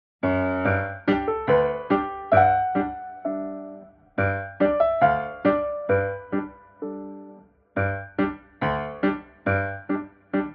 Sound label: Piano cover; Tom Nook; Animal Crossing